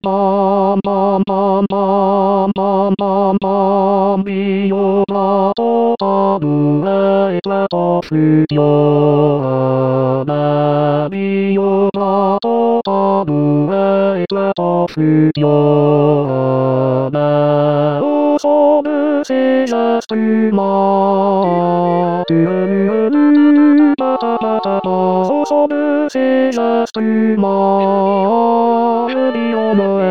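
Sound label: tenor; Guillot prends ton tambourin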